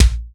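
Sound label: BD, drums; East West; Global Sounds; SPD11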